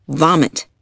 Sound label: VOMIT